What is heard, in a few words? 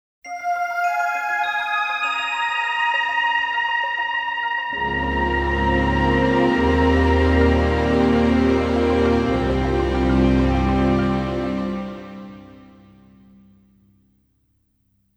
sound logo; intro office kitano